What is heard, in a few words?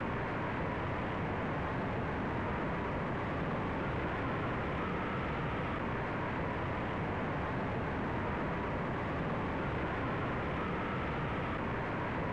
Wind; Fallout 1